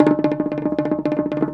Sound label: Drum Roll